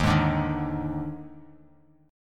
Eb Major 7th Suspended 4th Eb, Bass Standard; strummed; 11,11,12,8; EbM7sus4

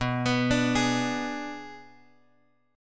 B Minor 6th B; Bm6 Chord {X 2 X 4 3 4} arpeggiated

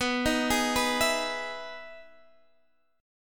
E Major 7th B, chord

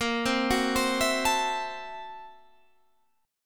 Gb 7th Sharp 9th Flat 5th Bb, guitar

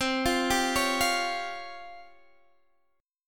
Db Major 7th Flat 5th C

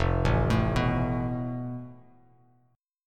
F Major Flat 5th, Bass Standard; FMb5 arpeggiated; JGuitar

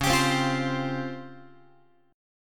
Db Major Sharp 11th, Guitar; strummed

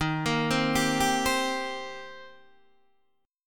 D sharp 6th, D sharp, down, Guitar, Open D

